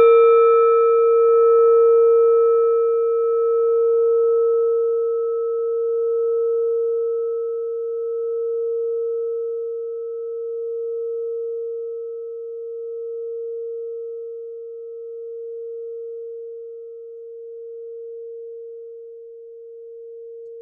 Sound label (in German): klangschale nepal, Klangschalen Nepal